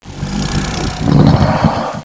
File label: monsters idle; oblivion darkangels